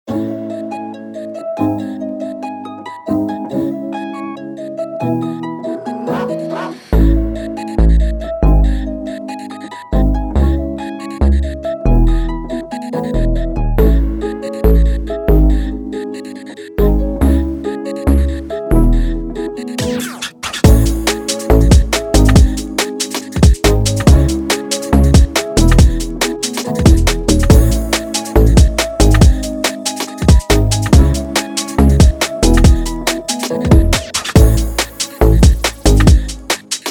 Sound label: INST 140BPM Dbm